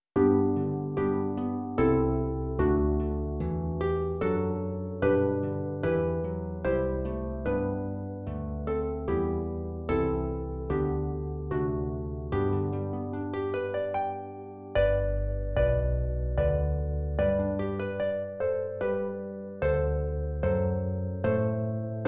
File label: Community Songbook for Harp Ensemble, American, Shenandoah